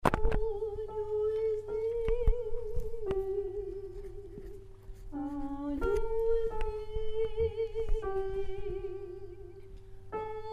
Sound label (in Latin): pie jesu sop